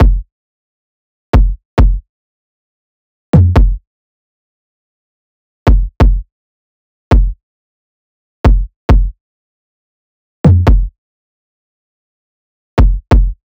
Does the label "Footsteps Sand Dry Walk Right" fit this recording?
No